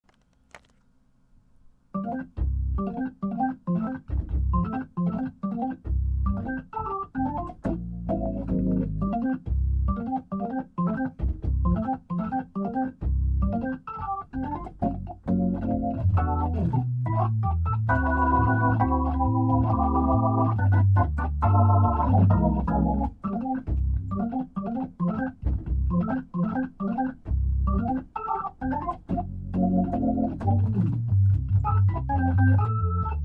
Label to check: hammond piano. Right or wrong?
Right